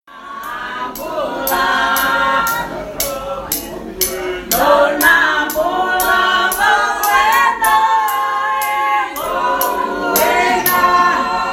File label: group singing